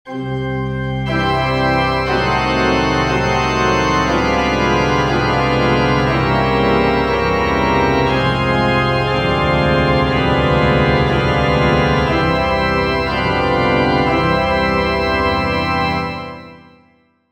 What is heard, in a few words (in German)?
orgelpunkt; dommer 1865